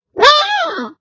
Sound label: Tail poke Sfx